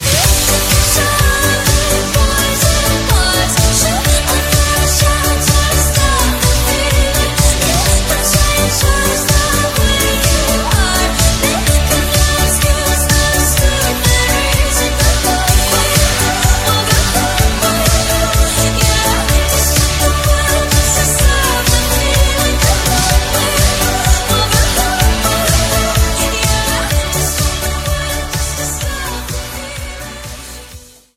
126 Bm

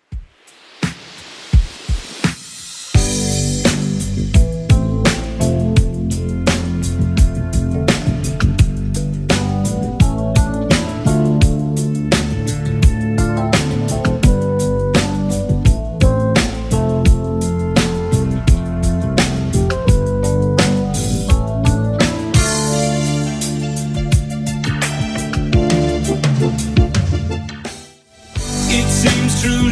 80s Redrum 85bpm

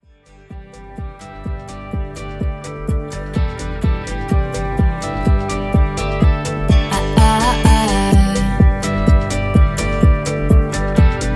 Future House; Remixfreakz

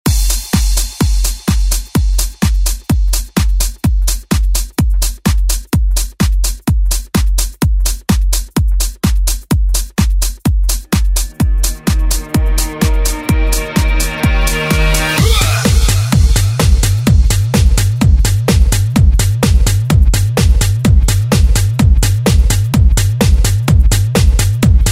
90s ReDrum

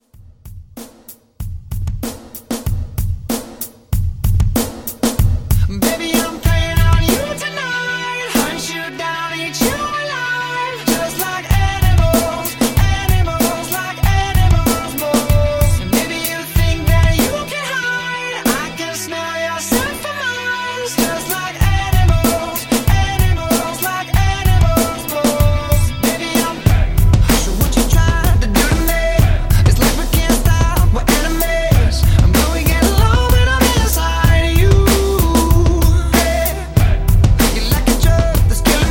Pop ReDrum; Remixfreakz

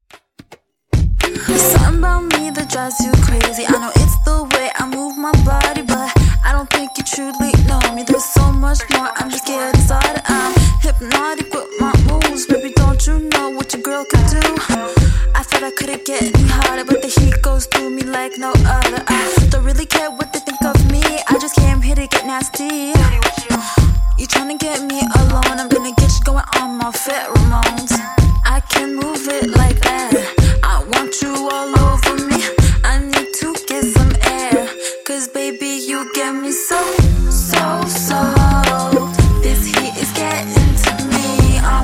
Intro Outro, 109Bpm

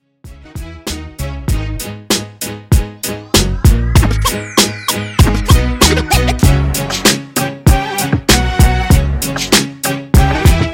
97 Bpm; Mashup

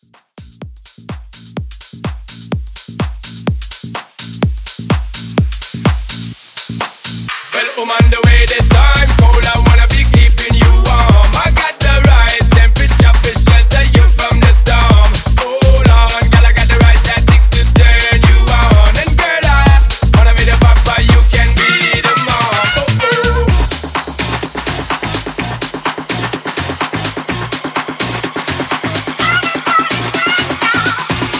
House Blend

